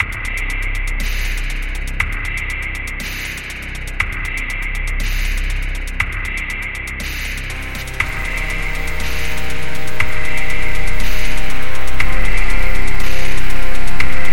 Battle

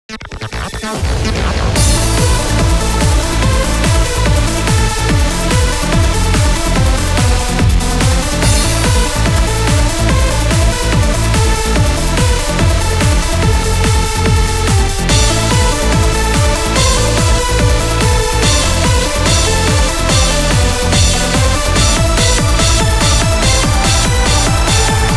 travel darkness, instrumental; Super Forest Beat vol11 2020

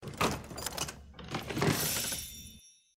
Chest; Goddess of Trampling

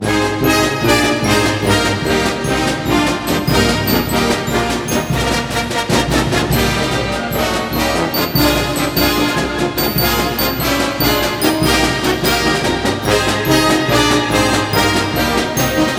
Broadcast Outro

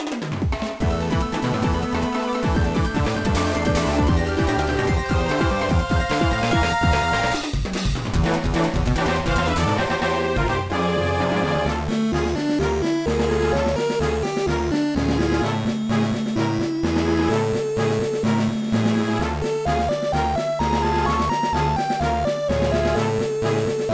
Area 4 Clear; The Wrong Trailer Pickup!; Screw Breaker Goshin Drillero; Game Boy Advance

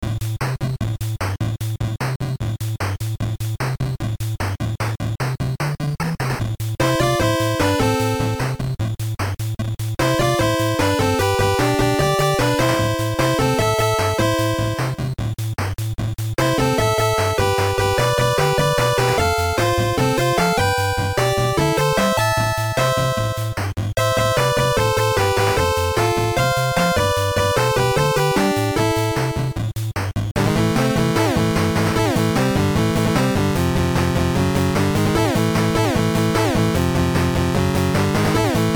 Water; Tcheco in the Castle of Lucio

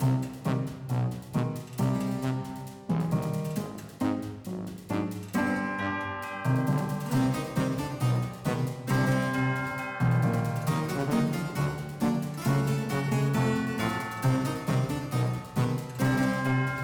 Music Spooky

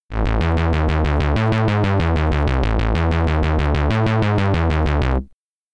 Jingle (SID Stereo); Wizball; Commodore 64

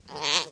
Llama Boy Scream; Farm Mania 2

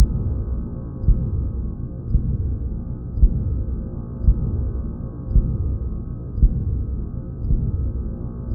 Attack Ambiance

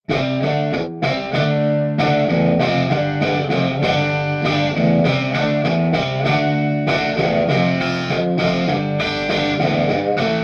subway play for tips, eguit; The Sims 3; windows gamerip 2009

